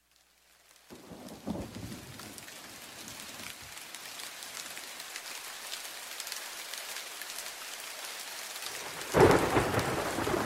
Rain

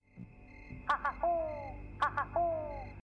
Haha Hoot

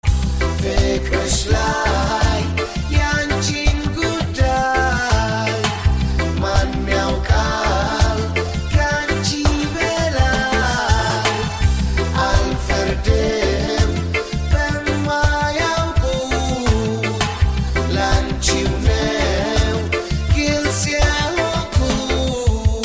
Ethiopian Reggae

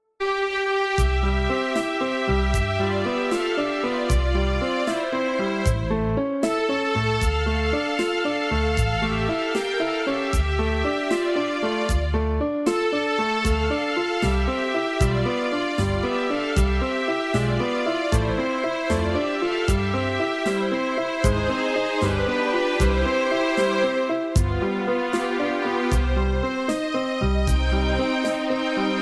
Risveglio Wake Up

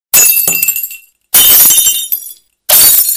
Glassbreak

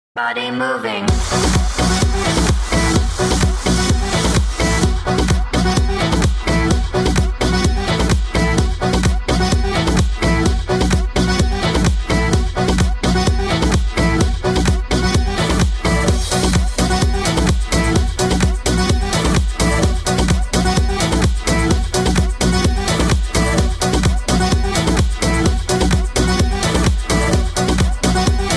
Club Version

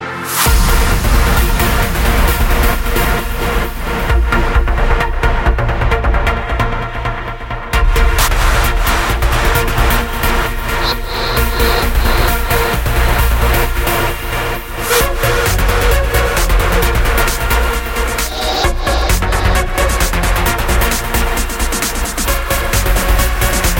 Only trance ringtone

